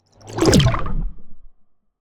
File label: Cinematic water whoosh